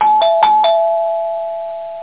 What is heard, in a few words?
pin; Internet 1996 World Exposition; Japan; NTT